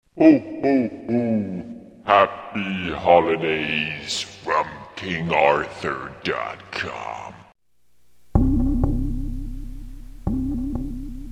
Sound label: Silent Night Modern Mix